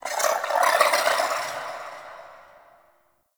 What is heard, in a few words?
Sentient Lair Exterior Creature; Misc Creatures; Ambience; Warframe